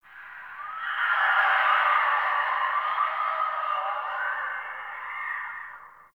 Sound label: Distant Infested Screams Winter H; Warframe; Ambience; NineteenNinetyNine